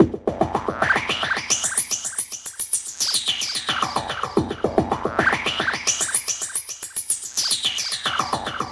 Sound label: SQUEEPIN; Distored Reality